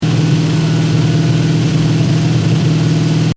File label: Engine: Medium Revs Loop, car; Vehicles; Car Sound Effects; Cars (Sound Effects:Vehicles); Stuart Duffield